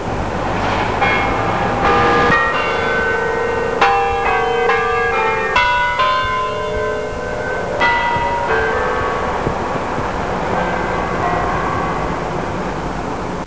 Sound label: bells